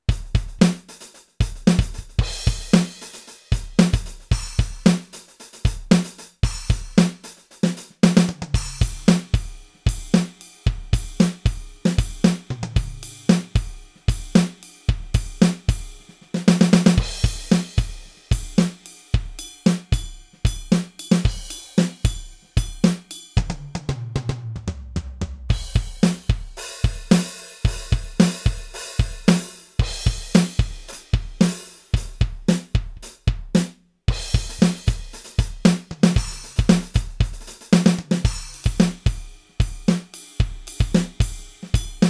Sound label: Millenium MPS 1000 Studio Kit